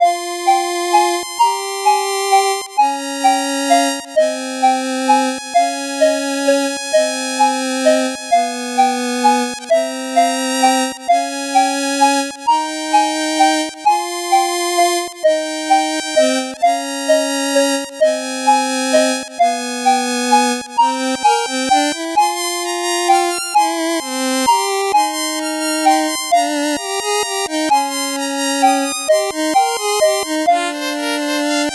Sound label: 8bit bgm normal